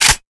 handle; cstrike; valorant; prime vandal

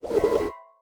twirl; cstrike; valorant; neofrontier sheriff

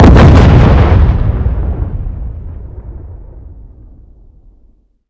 bomb first explode; Valorant; raze

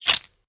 shell out; cstrike; valorant; neofrontier sheriff

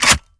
Chg Hndl Fwd FP; Valorant; ares

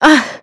pain; Valorant; cstrike; agent; fade

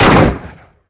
weapons; Valorant; cstrike; bucky

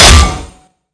head damage; Valorant

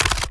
reload; valorant; araxys operator

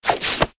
Attack 2 Return, karambit; cstrike; valorant; rgx karambit

